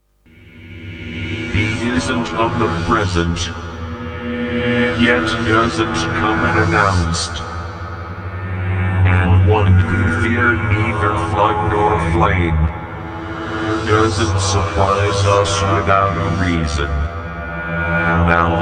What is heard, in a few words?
acapella